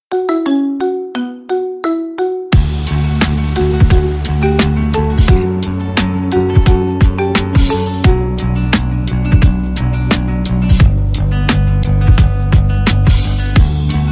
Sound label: marimba remix